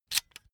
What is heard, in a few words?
electric drill template adjust heavy in